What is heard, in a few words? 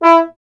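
bass trombone; Miroslav Vitous; Solo Instruments VOL-1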